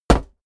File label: step walk metal solid; schritte